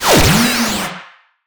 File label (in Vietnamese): Tieng xoet bay qua nhanh vien tuong